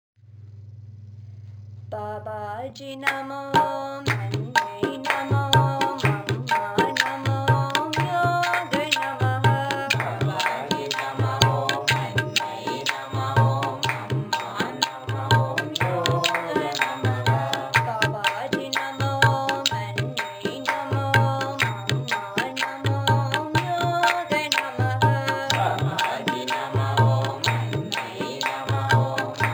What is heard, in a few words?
Chorus Bhajan Song; Babaji; Babaji Nama Om